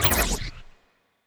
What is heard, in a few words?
evasion buff projectile, weapons; Stellaris; biogenesis